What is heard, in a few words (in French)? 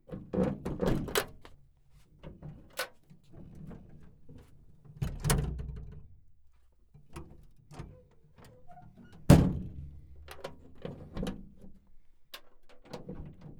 CAPOT OUVERTURE ET FERMETURE